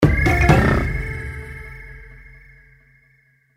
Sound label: symbol buffalo; Stampede Call of the Pride; Eyecon